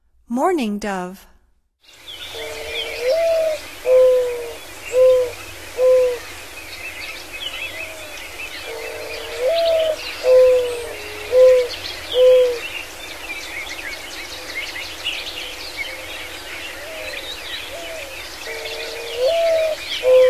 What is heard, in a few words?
Mourning Dove, Songs of Birds of North America; Stokes